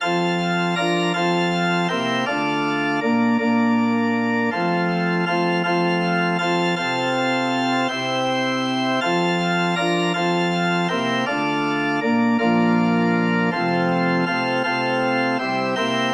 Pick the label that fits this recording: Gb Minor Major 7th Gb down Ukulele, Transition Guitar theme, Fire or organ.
organ